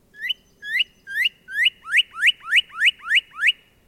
bird red; minecraft; technic

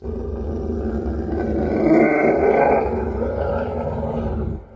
werewolf grunt; minecraft; technic

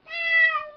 kitty grunt; minecraft; technic

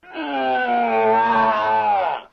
wraith dying; minecraft; technic

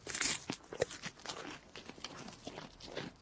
goat eating; minecraft; technic

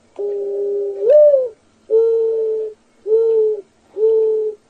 bird white; minecraft; technic

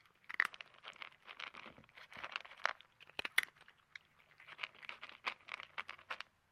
kitty eating; minecraft; technic

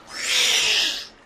dolphin upset; minecraft; technic

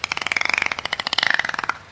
dolphin; minecraft; technic

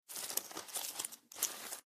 scorpion grunt; minecraft; technic

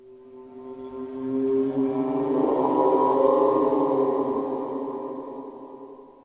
wraith; minecraft; technic

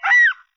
fox hurt; minecraft; technic

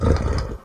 wolf hurt; minecraft; technic

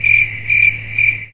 critter, wildlife; minecraft; technic

